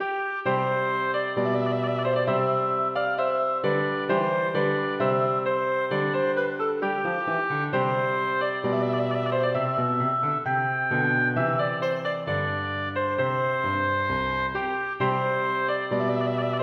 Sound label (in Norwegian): Marsch for obo og piano; Aamodt